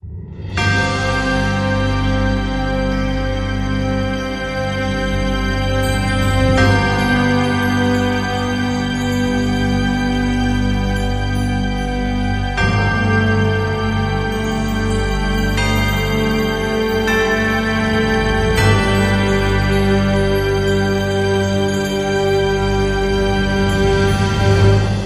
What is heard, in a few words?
Christmas Atmos